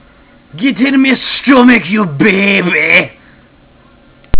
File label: baby